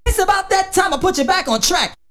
VOX SUNG; Megabass Remix! 2

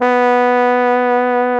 BARITON; Best Service; Alpine Volksmusik

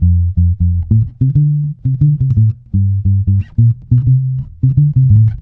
F, dub, bass; Spectrasonics; Bass Legends CD 1; JOY DUB

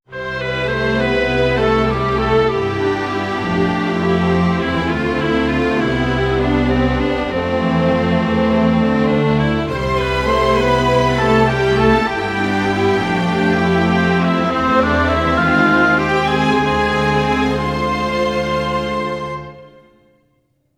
100 C maj full mix, Orchestral arrangements; PowerFX; Downtown Orchestra

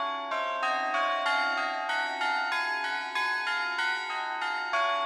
Tube Bells 95-C, horrorscape samples 95bpm

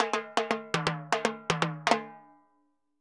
Timbaleta Poly, Drums & Percussion; Best Service; Festa Latina VOL-1

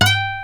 G 5D NYLON, Guitars; Spectrasonics; Hans Zimmer Guitars VOL-1

